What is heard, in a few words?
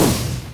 range projectile impact; dota; creep